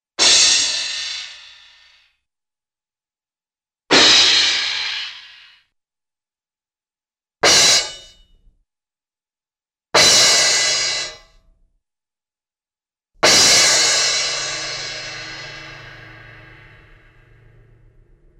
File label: Cymbal Crash; Cartoon; Hollywood Edge; Cartoon Trax